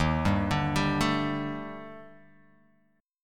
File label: Eb Major 7th Flat 5th Eb Guitar Open F; EbM7b5; arpeggiated; JGuitar